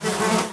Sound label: fly buzz multi